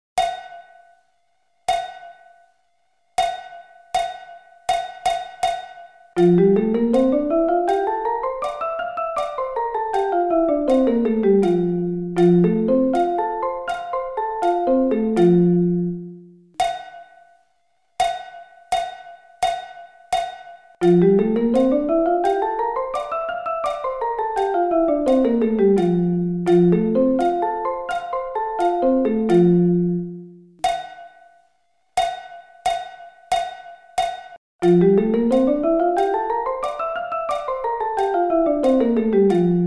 F Scale

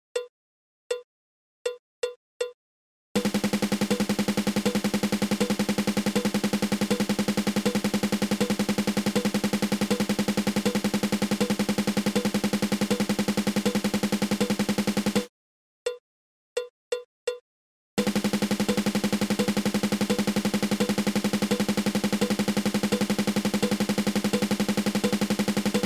Double Stroke Roll

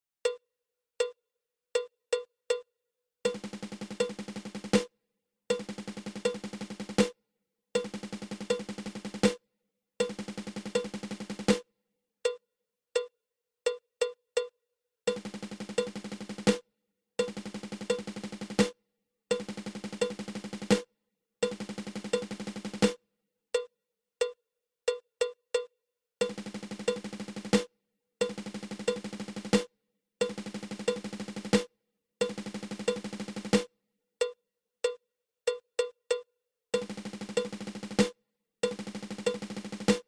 Seventeen Stroke Roll

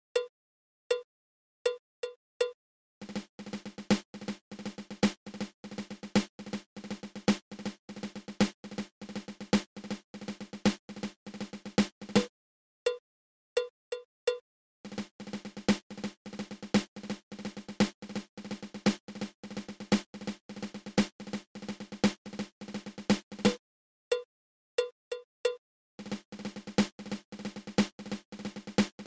Double Ratamacue

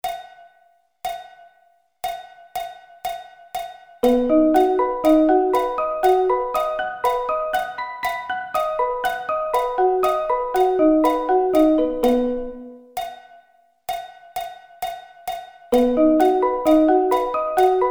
B Arpeggio Inversions

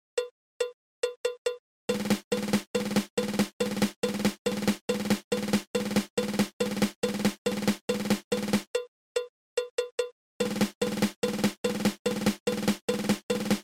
Five Stroke Roll

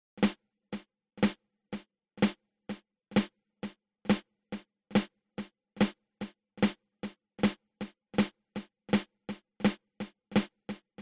Flam Tap open close open

